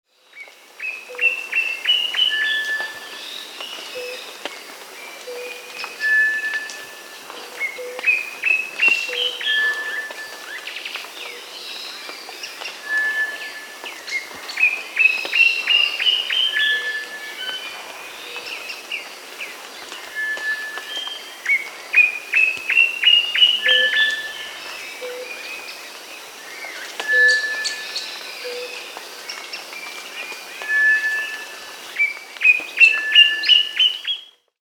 Black Antbird, Cercomacra Serva, Nature's Songs, S. America